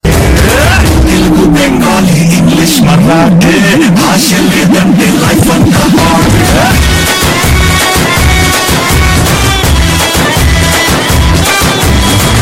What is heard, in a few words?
telugu ringtone